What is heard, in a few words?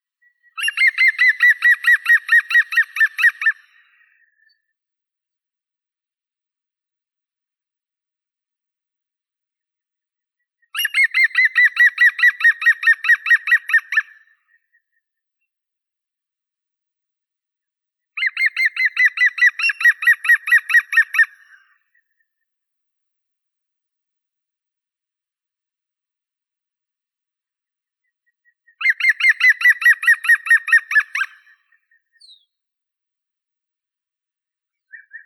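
green woodpecker, picus viridis; dyatel zelenii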